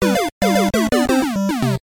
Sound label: Lose Life, Lose Life Theme, when losing a life; SMBDX